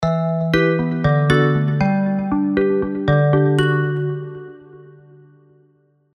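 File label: Spire, keys; Izlamabad; alonso happy holidays 2021 spire soundset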